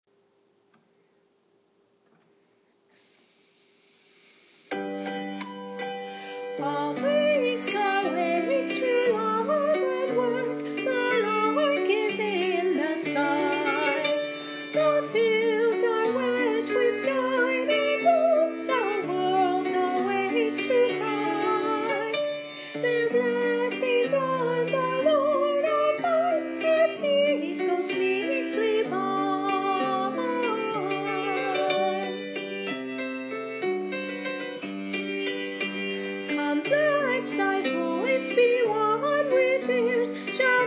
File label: voice